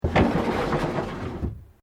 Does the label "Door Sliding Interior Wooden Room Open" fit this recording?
Yes